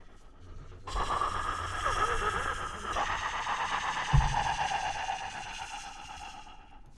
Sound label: Eerie Whispers